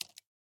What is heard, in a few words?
click